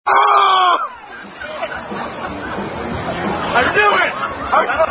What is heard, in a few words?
Girl Falls Playing Dizzy Bat, Drinking Game Played In Parking Lot